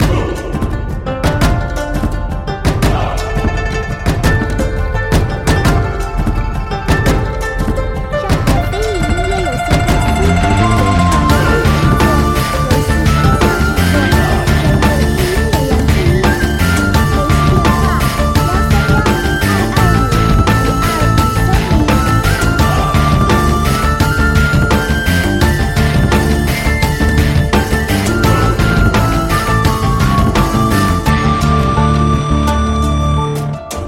中国风摇滚热血激烈战场; 战斗

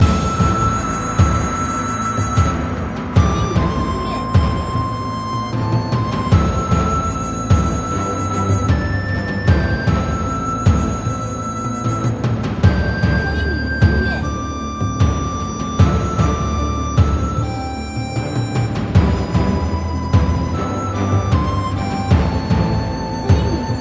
大气-唯美-严肃

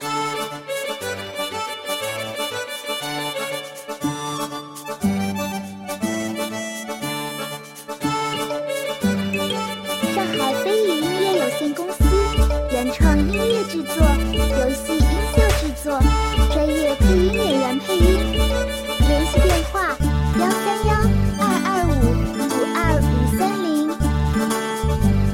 轻松-欢快-管弦-日系

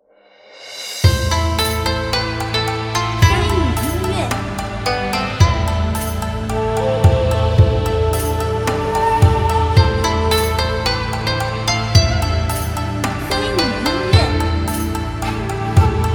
印度-悲壮-凄凉

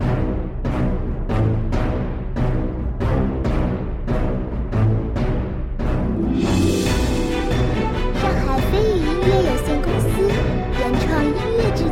紧张激昂战斗 圣歌 战斗

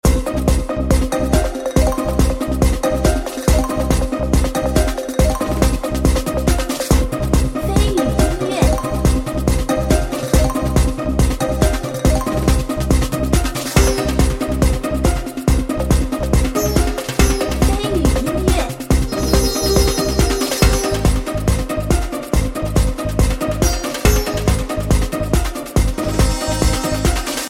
欢乐-律动

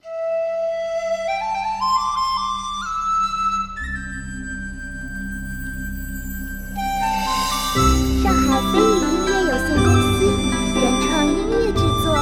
悠扬 舒缓 轻松 中国风

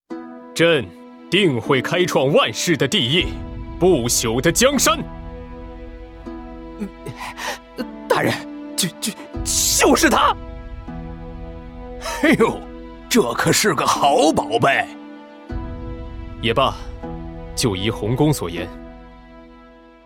男 BGM

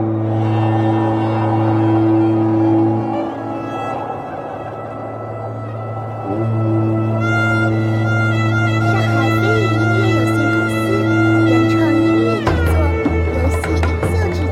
危险紧张; 上古封印; 流沙魔域

